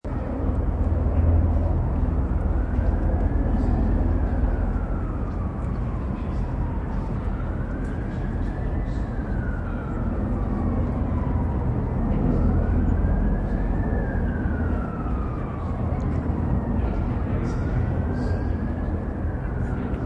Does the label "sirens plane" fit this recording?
Yes